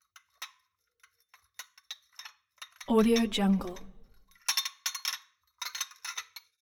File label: glass shot glasses handle long; foley; smartsoundfx